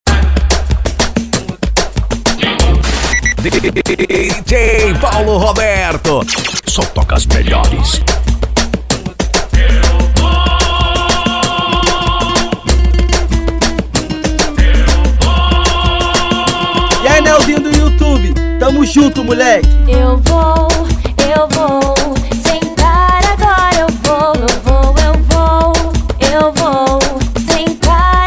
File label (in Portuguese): eletro funk; cd na batida do eletro funk